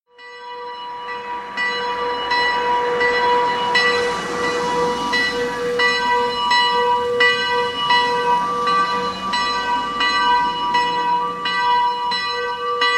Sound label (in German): Frohnlacher Rathausglocke; hans-heymel